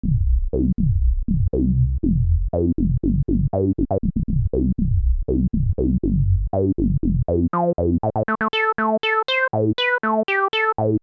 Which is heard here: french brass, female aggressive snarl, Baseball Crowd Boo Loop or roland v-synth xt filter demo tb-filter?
roland v-synth xt filter demo tb-filter